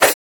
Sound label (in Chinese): 重攻击